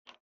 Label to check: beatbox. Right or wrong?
Wrong